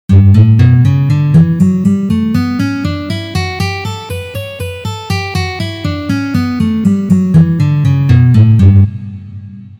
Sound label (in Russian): Gamma Re mazhor Tri noty 2 pozitsiya